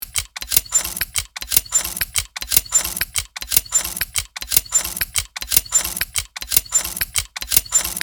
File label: Small industrial machine loop